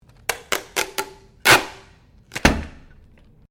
Sticky Tape Unroll; common tape; Offices; SoundEffects-Foundation4

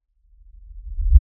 LFE Reverse, Lfe Reverse Element Swell Low Bass; Swells; Blastwave; Sound Effects:Sound Design

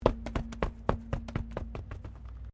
Wreck Wheel Alloy Bounce; Wrecked Cars, impacts, crashes, scrapes; Objects

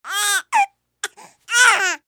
Human Baby Fuss Cry; Babies (Sound Effects:Voice)

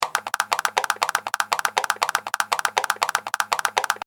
Timer Loop, alarm; electronic; Loopable

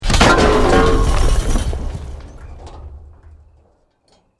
BUILDING COLLAPSE SCAFFOLDING SMALL

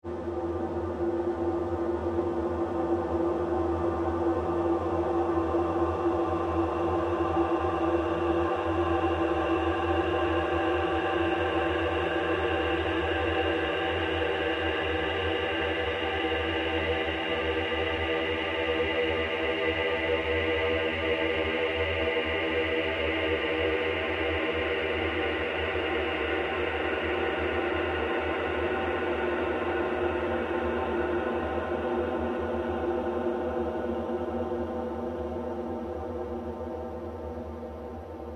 Created Drone, Drone Sound Effects; sound building blocks created through manipulation of raw material

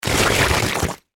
Impact Suction Mud, Texture, Large; Suction (Sound Effects:Objects); BlastWave

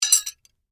Sai Put Together, Handle, Metal; Warfare; Sword Sound Effects; Swords (Sound Effects:Combat; BlastWave

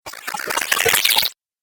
Droid Waking Up, robot sound effects; Sci-Fi Robot Sfx; Andrew Fleming

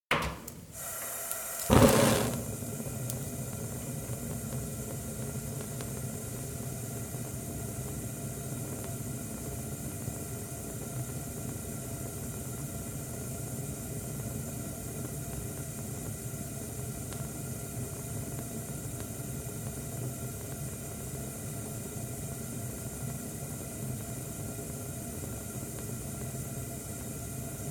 Gas Stove, one ring lit, burns, turned off; Food Prep; BBC

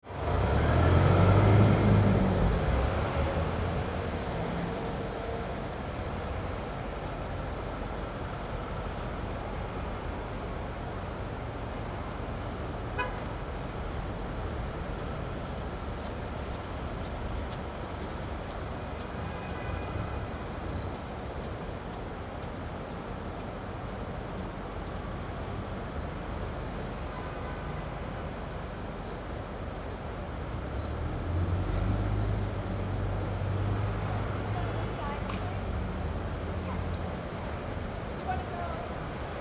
city lite traffic rain, City, Light Traffic, Rain; Light Traffic In Big City On A Rainy Day . Bus Idles Nearby; Backgrounds; Cities

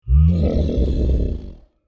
Creature Large Growl Aggressive; Monsters; Horror; BigRoomSound

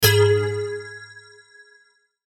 chime hit, Chime Hit Drum Warble; Chime Hit Drum Warble Production Element Imaging Element Accent Transition; Multimedia; Chimes; Blastwave

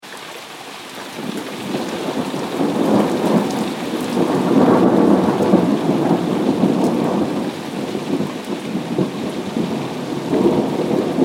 Heavy Rain Thunder Ambience; Twistedtracks

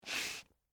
Dress Shoe Scrape, Concrete; Footsteps; Blast Wave

